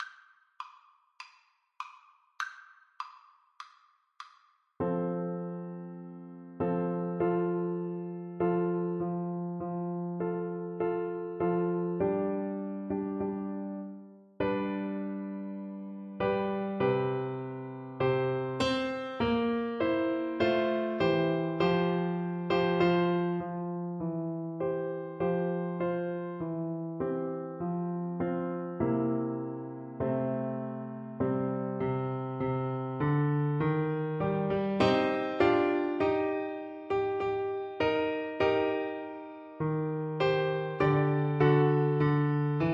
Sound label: locus iste FL kar, Flute